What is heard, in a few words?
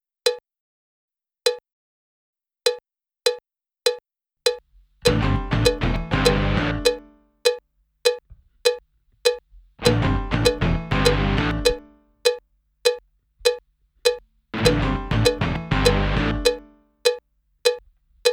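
click stereo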